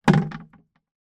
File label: household watering can plastic empty place down hard surface; sound effects one